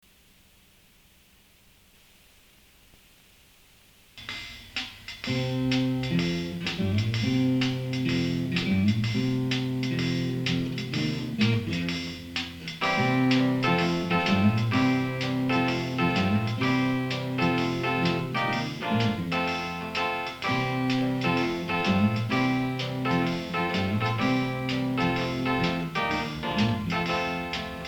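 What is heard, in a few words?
first song with beat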